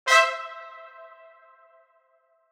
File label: Brass; WeakAuras